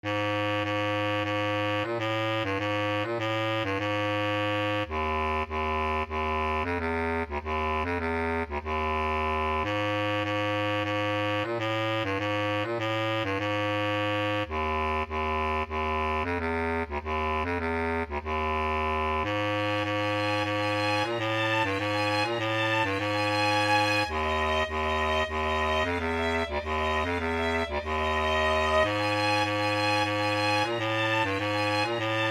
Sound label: Classical Test